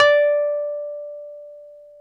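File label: piano, Wurly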